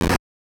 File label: destroy rock with jump; ducktales